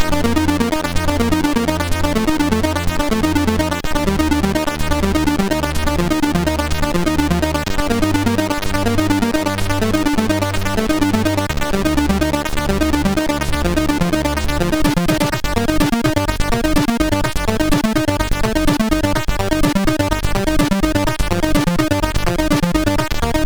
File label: Fart, sid, MOS6581; MOS6581R2; Clowns Fart